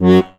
HOHNER, AKKORDEON

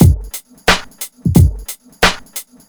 FK BEAT, FUNK; USB Soundscan vol.47; AKAI; Hip-Hop & R&B

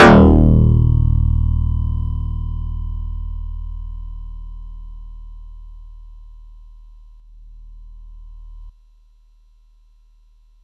Yamaha YX81Z Elec Bass d#2

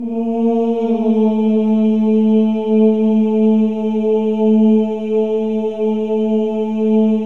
Voices

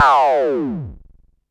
Perc Synth Raw

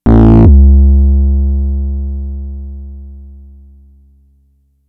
FX, Drum Machines; Fricke Schlagzwerg

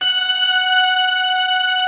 HARPS, Harpsiflute, Harpsi Stack; Optical Media International; Sonic Images Library

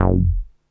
NASTY BASS; Masterbits - Vintage Voltage; VV BASSES

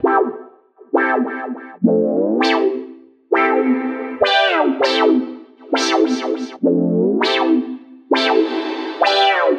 100 A synth dub splash; Zero-G; Future Wave

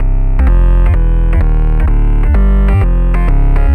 Bass, uk garage, 128bpm

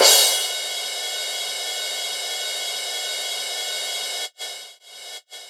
Yamaha CS6, Drums

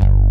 POLY BASS; Pro-Rec - Synthsonic; BASSES

